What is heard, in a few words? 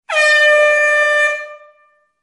Loud Alarm